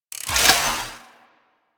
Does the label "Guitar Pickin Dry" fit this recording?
No